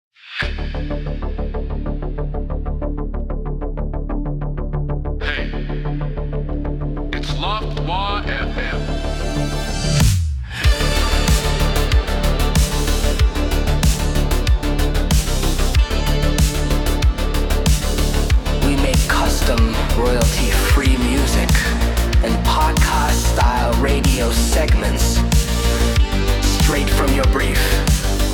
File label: Synthwave Segment; haha fm